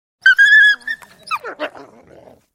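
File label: howler monkey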